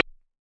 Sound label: nasty stormfront; Nasty Stormfront Electro FX